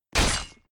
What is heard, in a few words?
killing stab; mm2